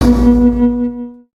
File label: Yar Har